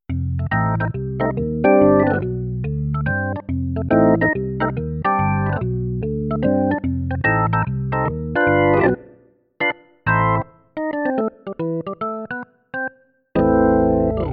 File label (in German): CA67 Orgel